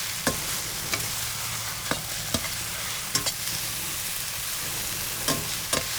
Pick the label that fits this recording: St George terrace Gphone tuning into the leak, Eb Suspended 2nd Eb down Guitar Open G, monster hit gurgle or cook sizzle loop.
cook sizzle loop